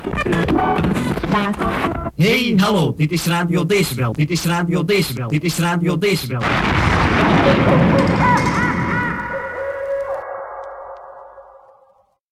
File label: jingle; Decibel Deurne